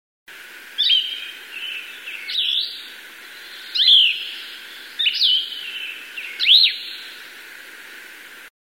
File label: Cassins Vireo